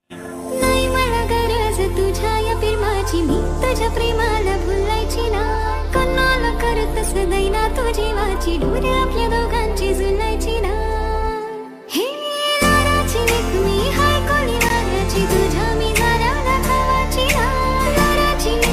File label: marathi ringtone